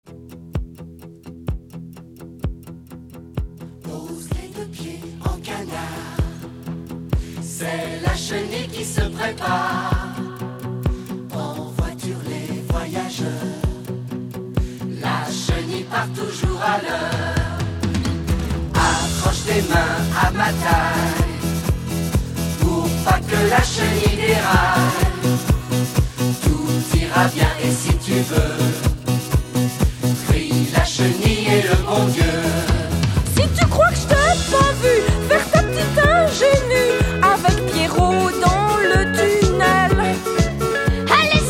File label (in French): Accordeon - musette ambiance